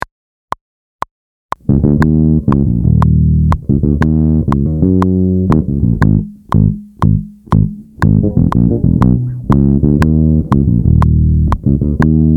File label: Center time with click